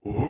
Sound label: Ruin Fat Sentry Prepare